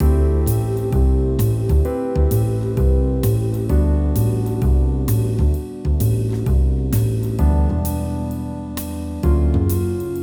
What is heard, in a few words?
BGM; RandomGFGoStop